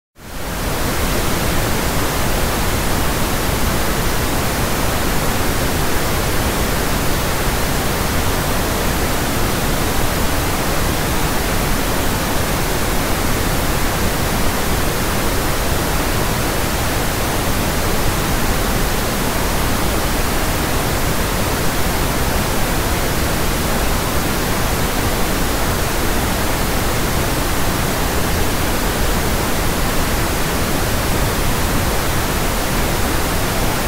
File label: White Noise